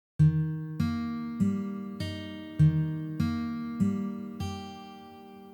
Ornamentation No Frills Slow

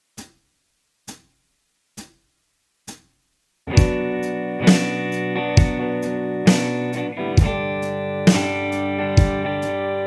fretboard chart slow

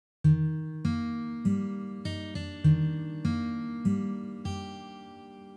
Ornamentation Pull Off elsewhere Slow

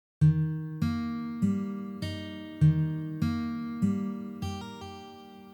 Ornamentation Triplet Slow

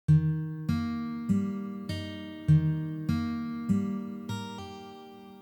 Ornamentation Pull Off Slow

